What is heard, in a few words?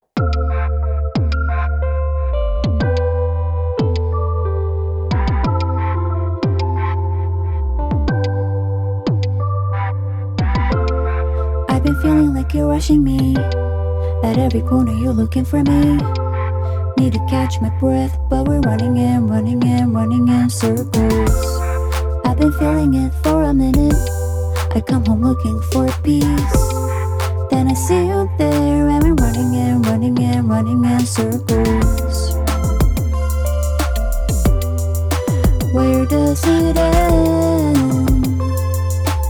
Go Keys 5 Hip Hop Beat